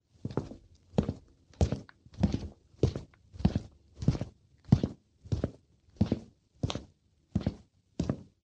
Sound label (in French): bruit de pas; deambuler